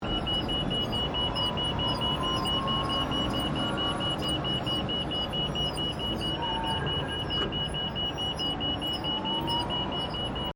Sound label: Trolley Motorized Luggage Train Station Moving Loop, Electric luggage trolley; Carriages; Vehicles